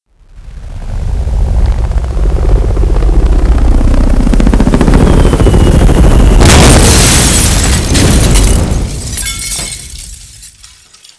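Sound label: heli explosion; escape2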